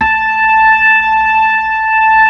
B-3, Brite; Keyboards of The 60's and 70's

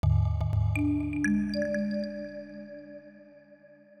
Synth Block Loop (120BPM); musicradar

